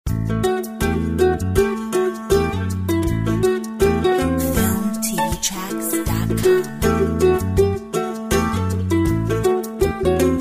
Cool Mandolin; Filmtv-tracks

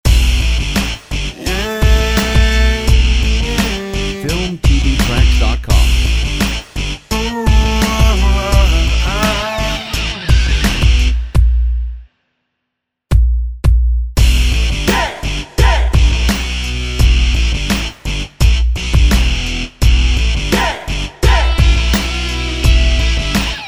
Energetic Powerful Indie Rock

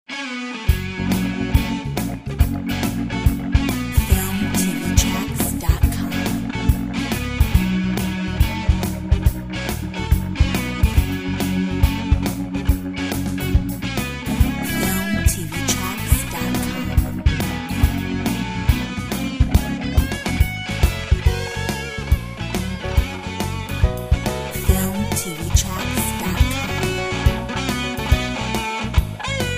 blues

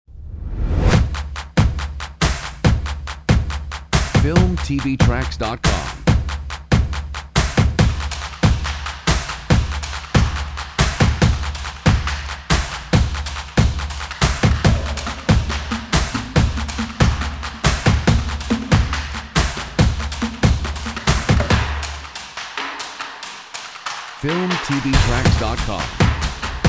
Energetic Percussion 140

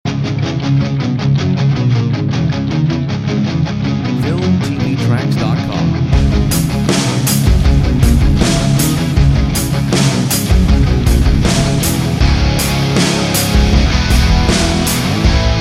Distorted

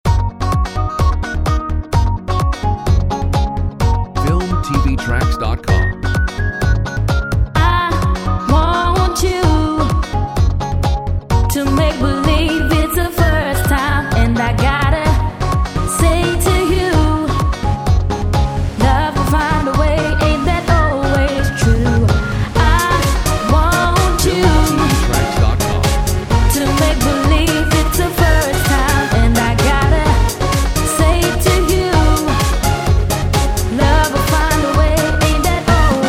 Summer fun vocal whistle

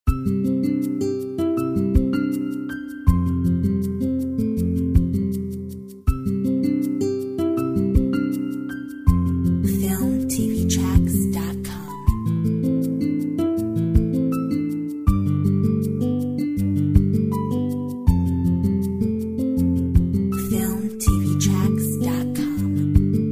Spring Blue

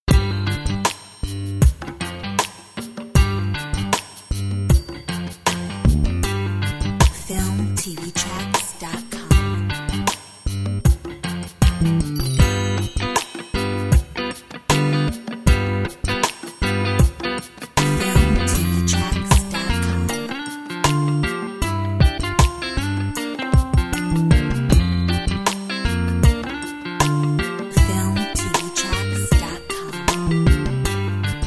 Slow funk

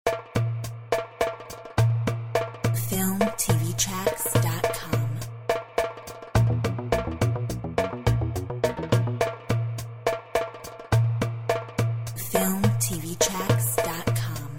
Drum bass; Filmtv-tracks

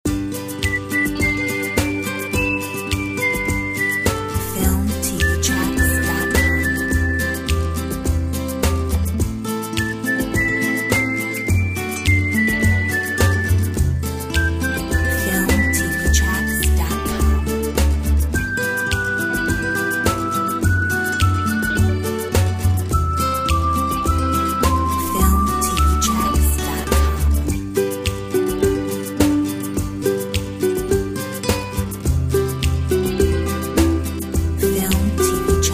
Little Paradise Whistle mix; whistling and happy music track

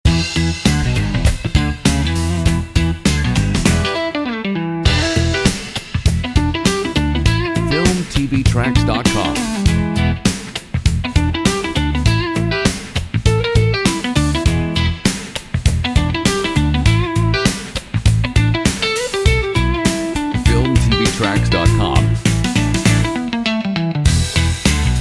Funky

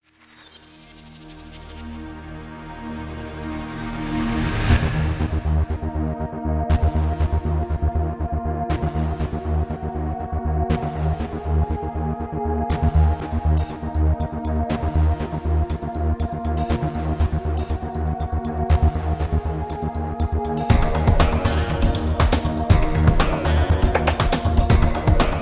Action

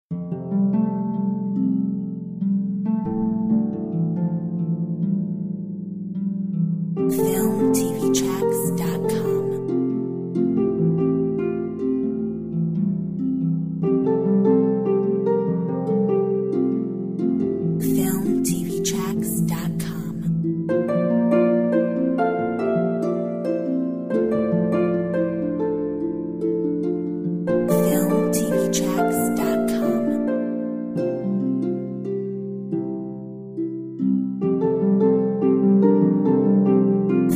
silent joy Harp

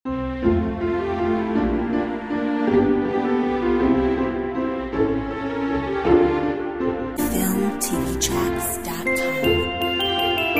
We wish you a merry christmas score

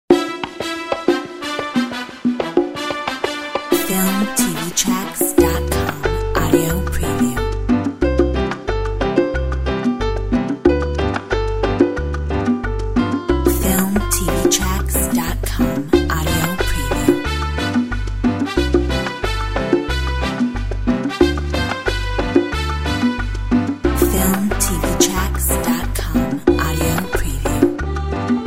Mambo

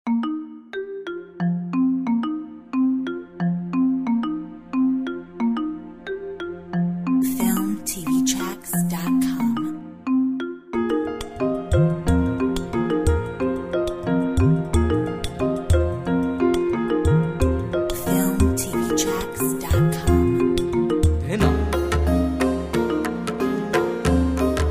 The Mallet dance

